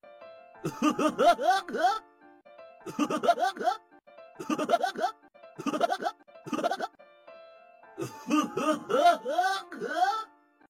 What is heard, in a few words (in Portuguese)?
risada estranha